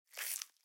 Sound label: egg click